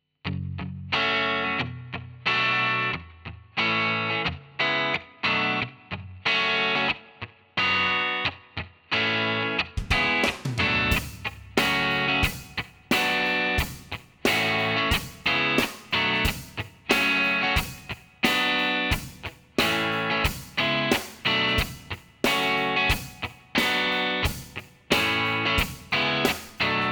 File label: pod x3 electrique; line6 ux8